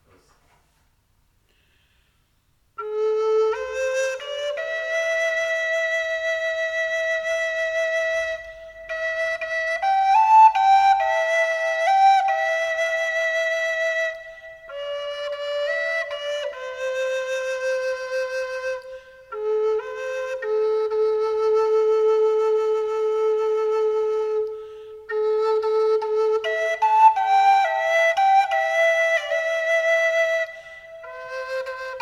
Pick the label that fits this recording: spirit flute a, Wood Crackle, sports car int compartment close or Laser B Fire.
spirit flute a